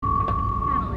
incoming hail, computer

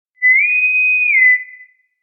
bosun whistle; TOS

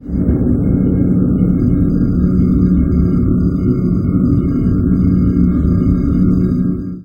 engine hum; alien sounds; borg

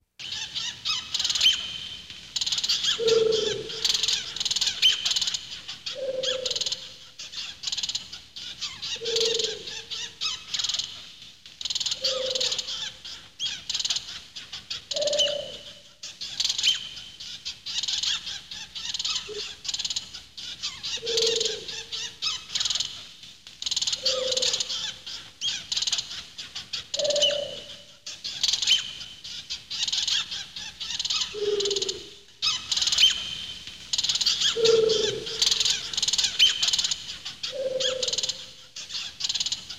many tribble; TOS

